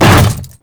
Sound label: Hammer Boss Step Default, Footsteps; Warframe; Grineer Hammer Boss